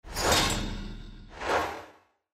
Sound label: Bonus Initial; slots; Robot Vs Monster